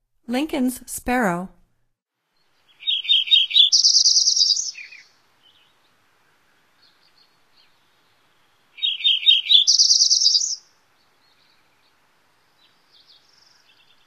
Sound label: Lincolns Sparrow, Songs of Birds of North America; Stokes